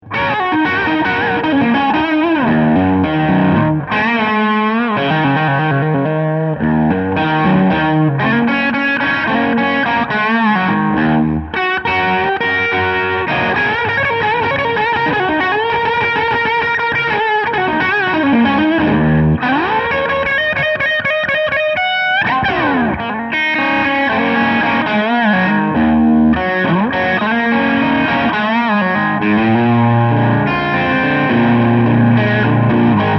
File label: low boost